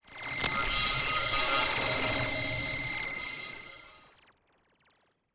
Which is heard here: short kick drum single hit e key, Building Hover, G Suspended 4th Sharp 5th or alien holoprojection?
alien holoprojection